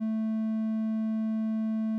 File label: tri osc